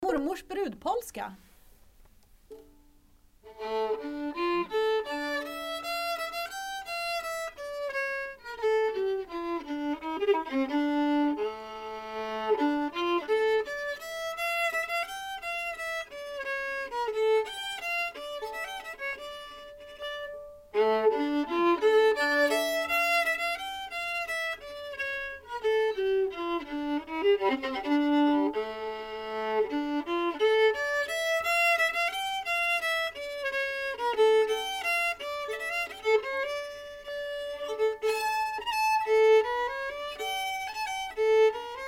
brudpolska

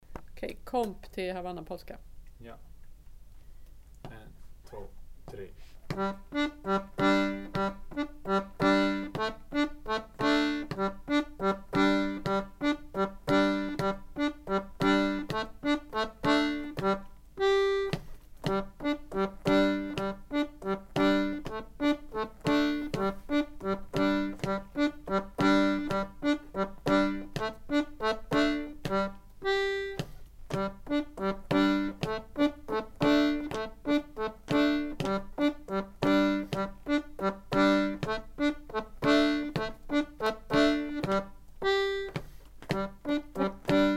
Komp, Havannapolska

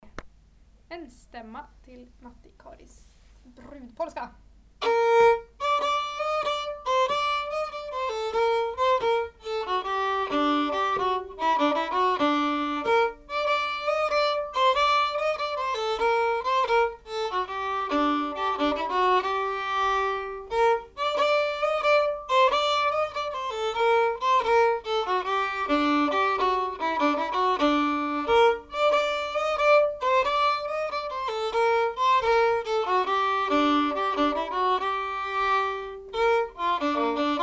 Stämma brudpolska; Matti Karis

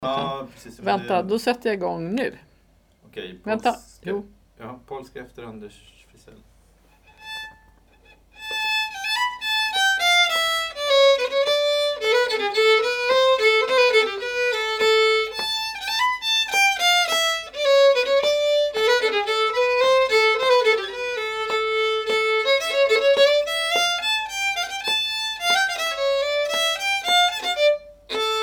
Polska

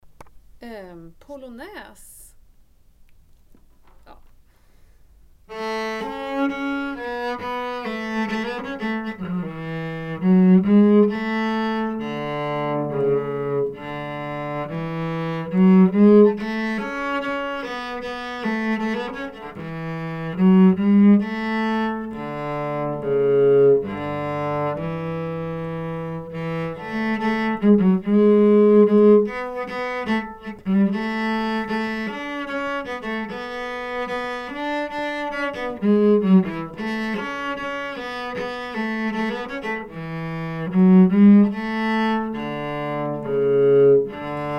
Polonäs stämma

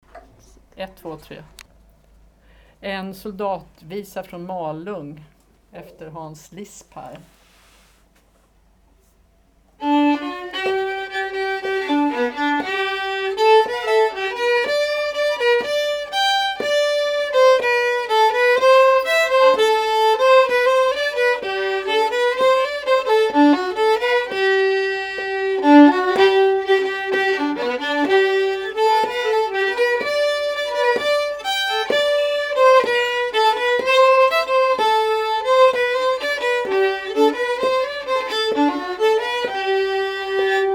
Soldatvisa från Malung

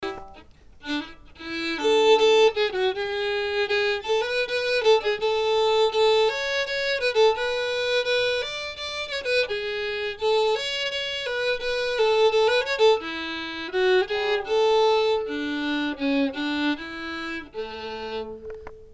Stämma b del

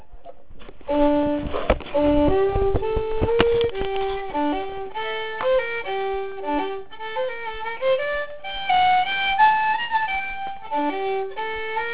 Polska; efter Torbjörn Wallin

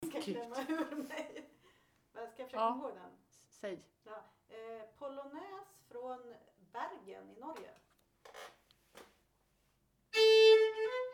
Pollonäs från Bergen

